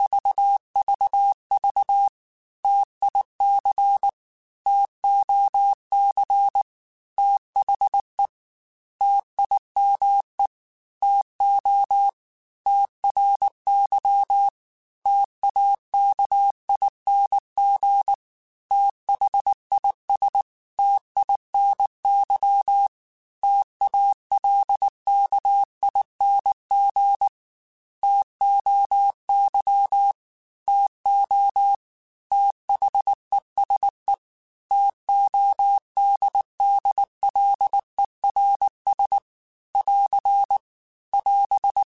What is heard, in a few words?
T Runs, Morse Code; HamRadio; Prefixes